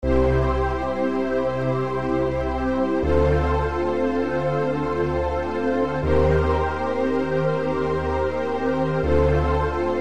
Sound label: PAD; Synthelium; Eclectic Thoughts